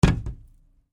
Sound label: Cardboard box hit; Material components for constructing more complex sounds; Materials